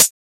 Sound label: Closed Hats